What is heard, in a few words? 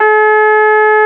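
Troubadour Harp E2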